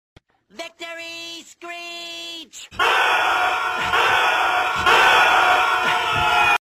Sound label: victory screech; htf version